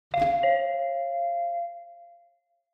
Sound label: Ticket Gate Alarm, Timbre2 Flap Far